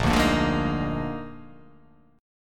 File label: A sharp 11th